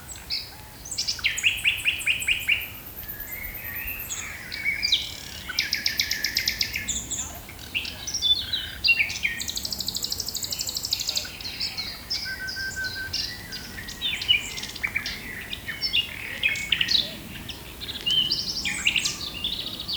nightingale song, near the forest. Beautiful little concert; Nightingale (Słowik); Recorded on 2025-04-22 on a short bike trip near the forest